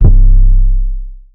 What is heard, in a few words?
808 C; Aqua; Cymatics; 2022 Melody Mystery Expansion